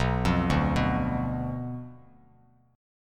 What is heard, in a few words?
B Diminished B down Bass Standard; Bdim arpeggiated